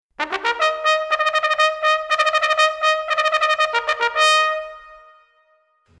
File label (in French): Sonnerie Le Ralliement